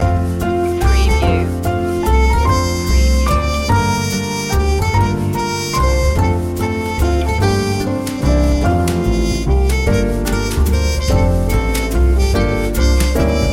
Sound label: away in a manger jazz